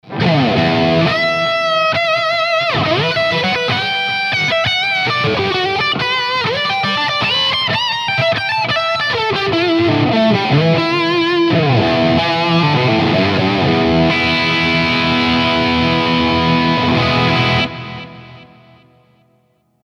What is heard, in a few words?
Friedman Crunch Delay Reverb